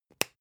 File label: spice jar smack close